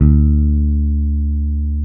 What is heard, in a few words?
P BASS D3, pick bass